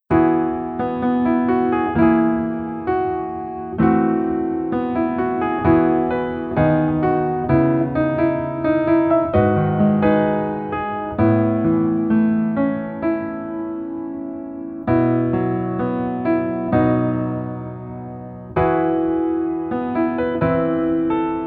Piano ver 여자 키

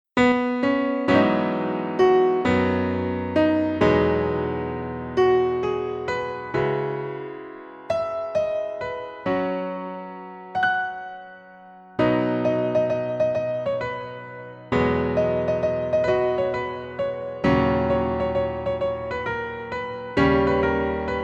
Piano Ver 멜로디MR -1키

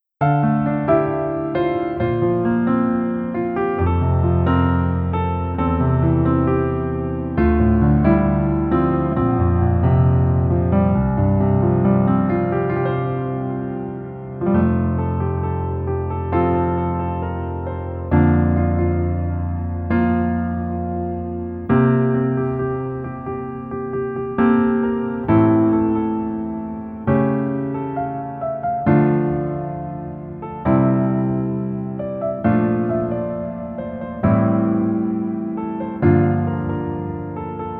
Piano ver 멜로디 MR -1 키

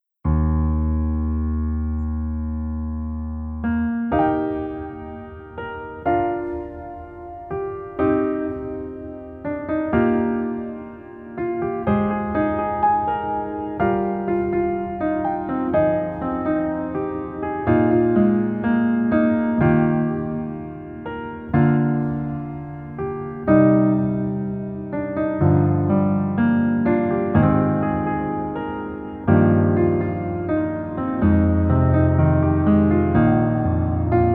Piano ver, 멜로디MR, 남자키

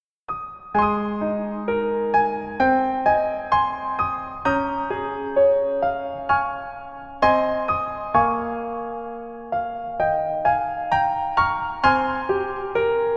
Piano ver 멜로디MR 여자키

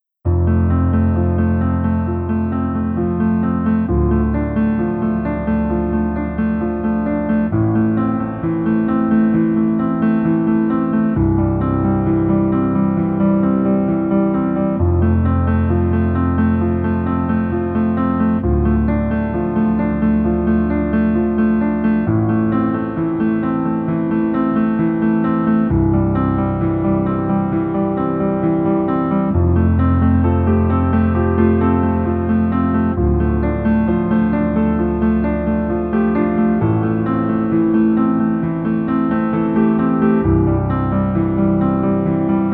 Piano ver 멜로디MR

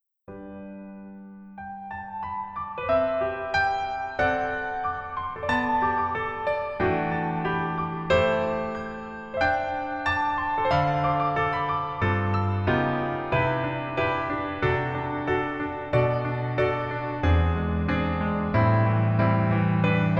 Piano ver, 멜로디MR; 더킹, 영원의 군주

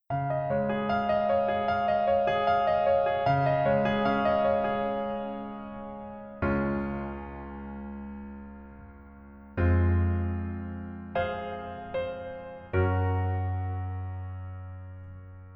Piano ver -1 키; 꼰대 인턴

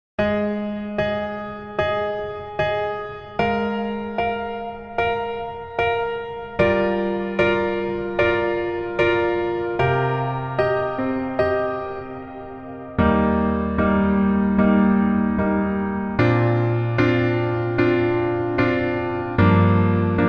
Piano -1키

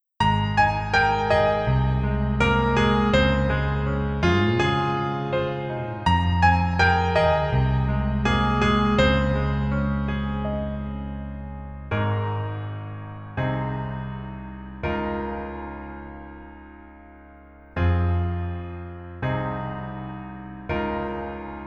Piano ver, 여자키

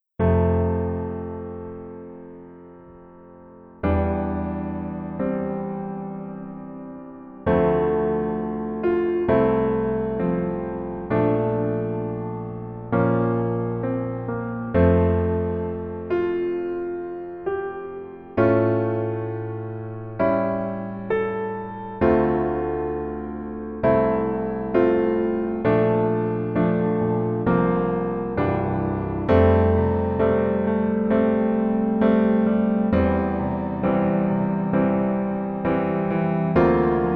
Piano ver -1키

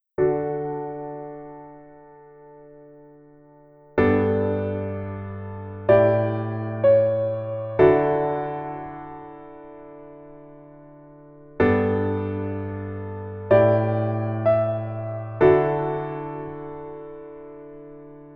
Piano ver 여자키

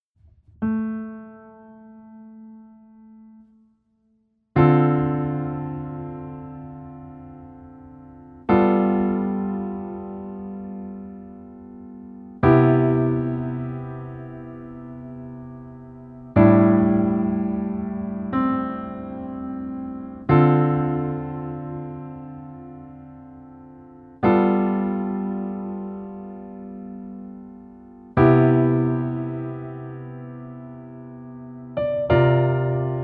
Piano ver, 여자키

